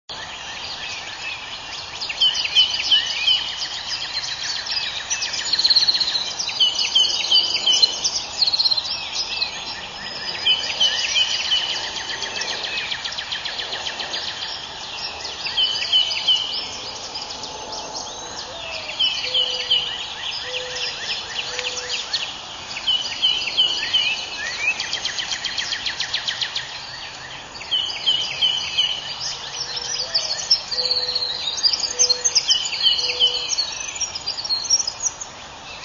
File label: Winter Wren; At the same time the Winter Wren is singing from a 10' high perch at a tremendous rate of speed.; Hoh Rain Forest, Olympic National Forest, WA